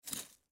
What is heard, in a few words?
Knife Pick Up, Knife Pick Up Dirt; Foley; Pick Ups; Objects; Blastwave